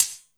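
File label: closed hi-hat, drum & bass; Ueberschall; Drum & Bass Resonance; 150 BPM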